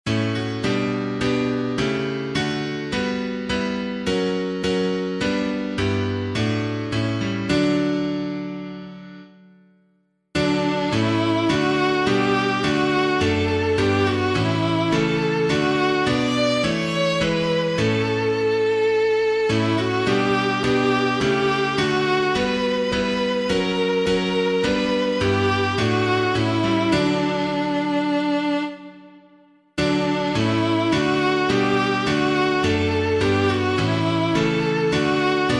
The Martyrs Living Now with Christ, HORSLEY, piano, hymn